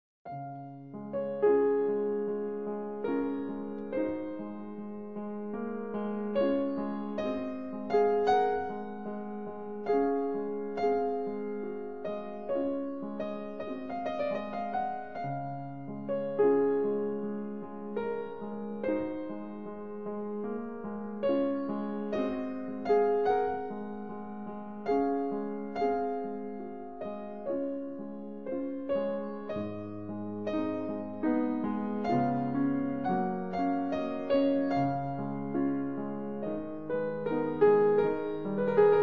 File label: Prelude Op. 28 - 15 in D sharp Major Raindrop